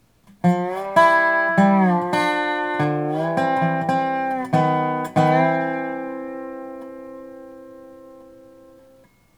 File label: hawaiian lick